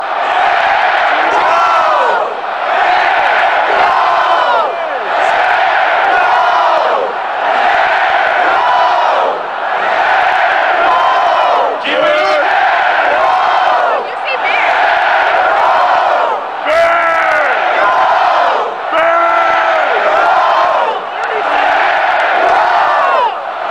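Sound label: go bears go, football chant; Sung after a Union goal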